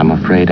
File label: fall; quake2; hal